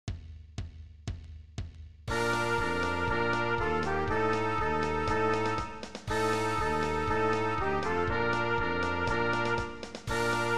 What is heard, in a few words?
Tempo 240